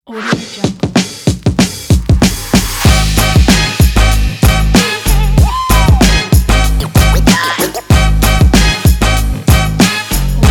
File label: tv show